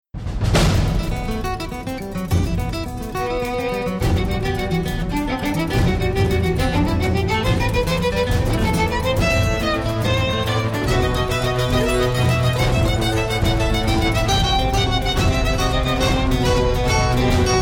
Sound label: choose team